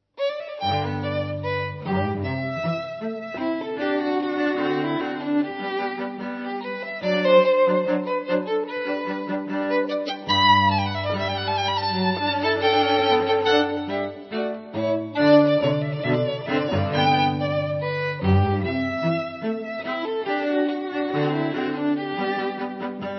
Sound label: Duet; jambiya; quartet